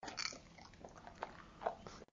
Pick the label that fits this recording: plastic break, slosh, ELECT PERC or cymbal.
slosh